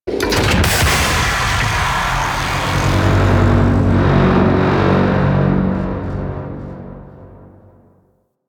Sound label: Cargo Train Exterior Coupler Detach; Warframe; Corpus; Railjack